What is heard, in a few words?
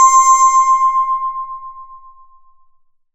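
bell C6, PPG Wave 2.2; Leads and Keys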